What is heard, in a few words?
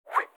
HAPTIC INFOAREA SWIPE; Sharp; AQUOS sense lite SH-M05